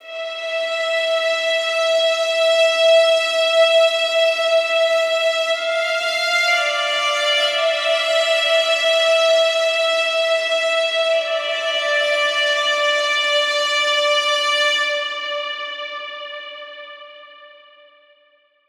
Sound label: Violin Am 65Bpm; Construction Kits; STEMS; Ghosthack; SkyRealm; Kit 30 - Darkest Hour